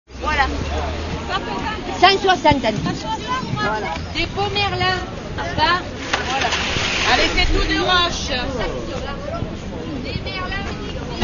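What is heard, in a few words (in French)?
ambiance poissonnière; mixage audio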